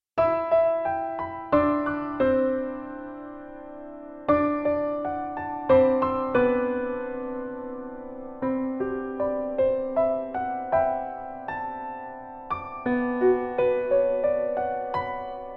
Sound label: piano